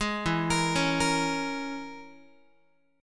E 6th Flat 5th E down Guitar; E6b5 arpeggiated; JGuitar

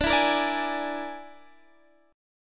E 7th Flat 5th, D

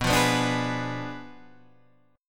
B Diminished 7th, Guitar, Half Step Down

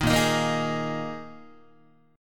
A sharp 7th Suspended 4th, Guitar; strummed; JGuitar

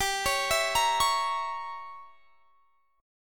G Diminished 7th; Gdim7 arpeggiated; x,22,23,21,23,21

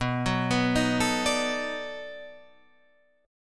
B 7th Sharp 9th, down, Guitar, Open D

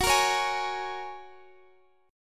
Bb Minor 7th Sharp 5th

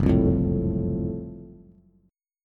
F sharp Minor Major 7th Flat 5th, Bass; chordshape; down

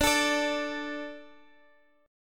D 5th, Guitar; strummed; x,17,19,19,x,x; 4E,4A,5D,5G,5C,6F; chord

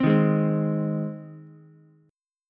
C Minor Sharp 5th C down Ukulele; strummed; JGuitar

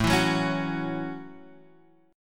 A 6th, Guitar, Open F; strummed

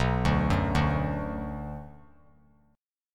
B-Minor; arpeggiated; JGuitar

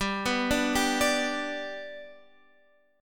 G Major, Guitar, Standard; arpeggiated; 15,14,12,12,15,x; down; capo=3

